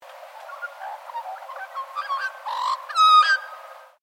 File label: Bird Magpie, Birds in Queensland; Animals; SoundEffects-Foundation1